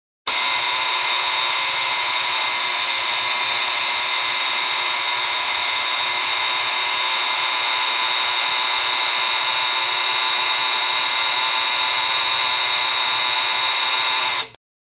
Automatic Grinder

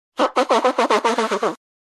Rhythmic Nose Blowing

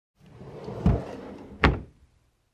Drawer closing softly

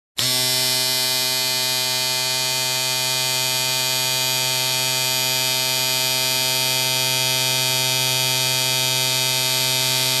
Electric Shaver

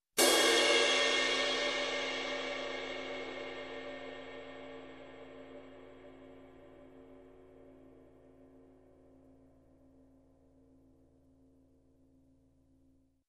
Crash Hit

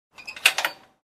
Tightening Loose Gear

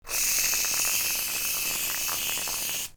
Hair mousse spraying

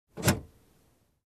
Unlocking Car

